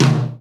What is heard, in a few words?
TOM, KIT Real-Kit